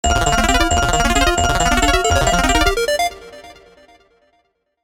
Casino slot machine reward payout

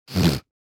Human sniffling quickly

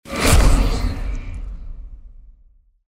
Sphere large evil

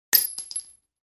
Bullets shell casings

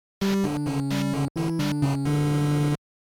Retro 16 bit or 8 bit game over tune

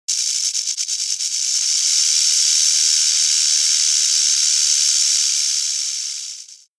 Rattlesnake tail rattle

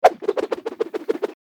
Boomerang flying whoosh; cartoon

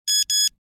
System alert beeps

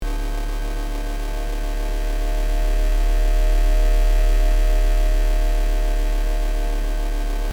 Futuristic power source loopable constant humming; Sci-Fi; Energy

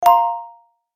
Gentle delayed bell alert

